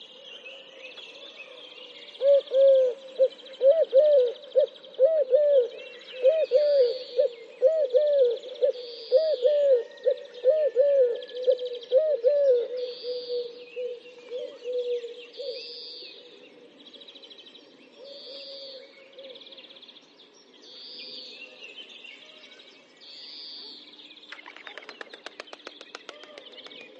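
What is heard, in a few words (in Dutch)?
turkse tortel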